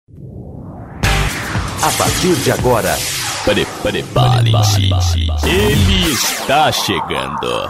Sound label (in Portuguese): abertura